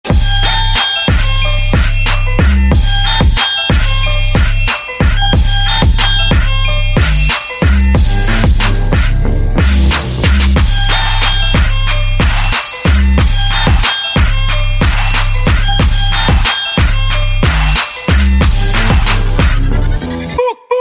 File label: Christmas Instrumental; ringtones